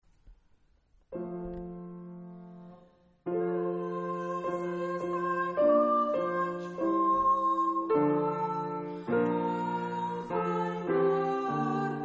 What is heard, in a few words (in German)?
Es ist ein Ros entsprungen sopran